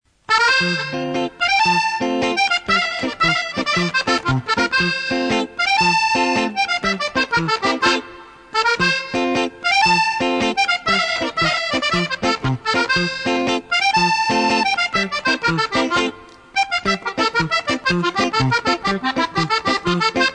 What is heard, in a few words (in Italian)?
manfrone doppio; BALLI SALTATI E NON DALL'APPENNINO BOLOGNESE